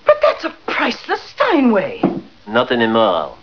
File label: panther steinway